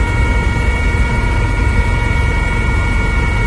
inside cell high stress loop mono left, Lynx internal audio loops